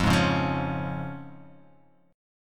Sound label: F Minor Sharp 5th F down